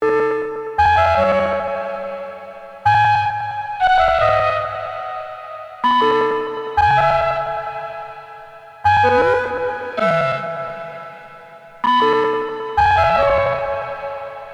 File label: ring modulated flute; livestream